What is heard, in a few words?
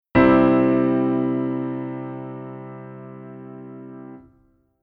Chord Pad; ABILITY